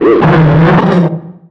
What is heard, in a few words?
dog growl; Half Life 2